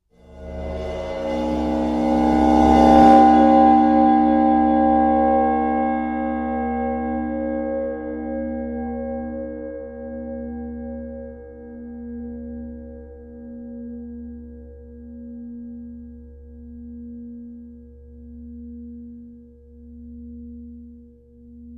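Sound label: Ride 22 Cymbol Bow close up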